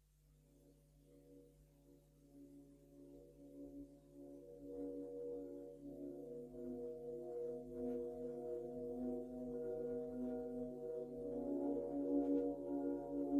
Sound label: NO STATIC NOISE